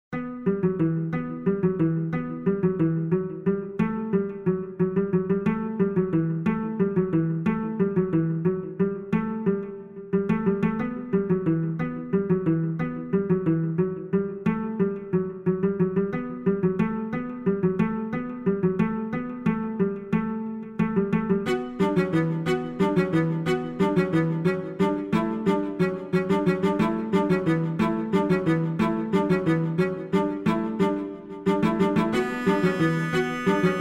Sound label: strings cello dance; captains strings